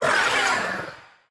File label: enemy death; bloom minigame